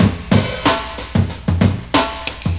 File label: breakbeat; World of Sound; instruments; beats